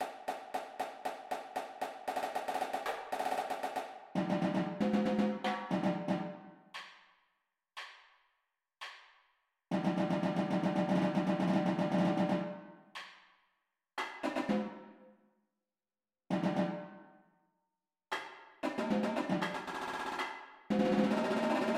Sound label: tenors